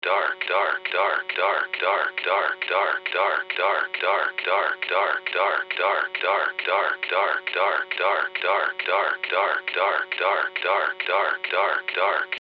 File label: pitch rise